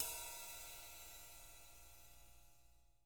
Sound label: rides